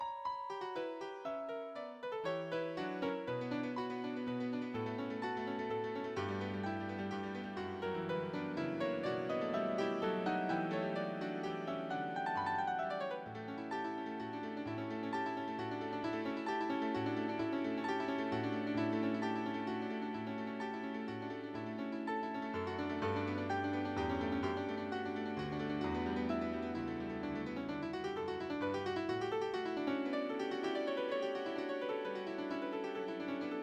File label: MIDI synth